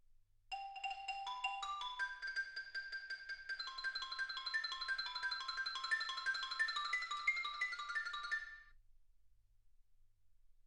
xylophone

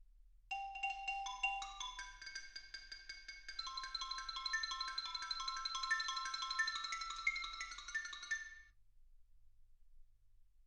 xylophone

